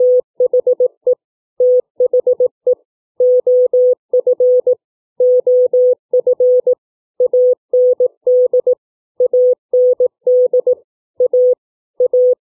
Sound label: double words, CW